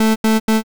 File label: machine error